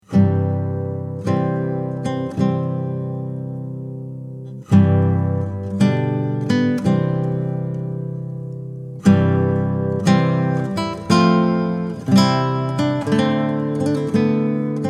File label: wedding march